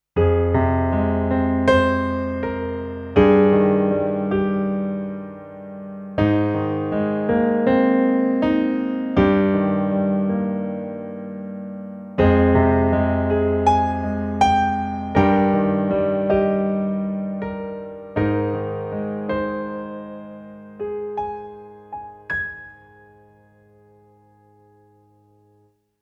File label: Piano; V3 Sound Sonority XXL